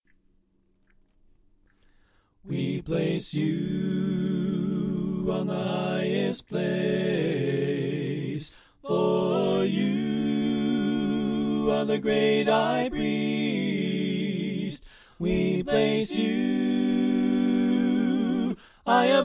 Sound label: Soprano Left